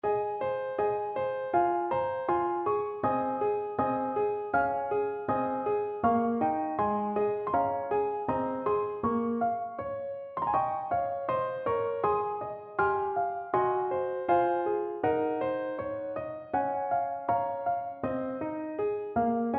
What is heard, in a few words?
horn, French Horn